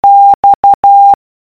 X morse code

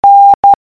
N morse code

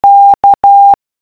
K morse code

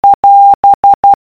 Morse Code Ampersand

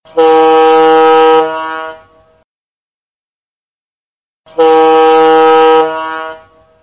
fog two prolonged blasts

